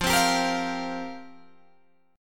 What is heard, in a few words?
F Suspended 2nd Suspended 4th F down Guitar Open G